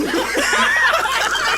laugh track; Snapcube Sonic 06

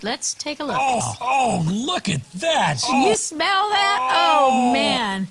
ohhhhh

